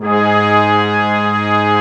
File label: BRASS G3; AKAI S6000 CD-ROM - Ultimate